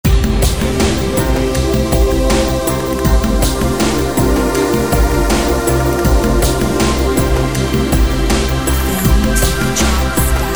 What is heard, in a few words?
Ambient force